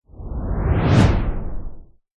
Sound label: whoosh white noise harsh; sound design; zapsplat